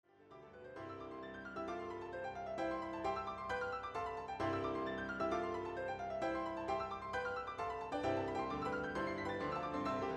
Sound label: 4 Hand Piano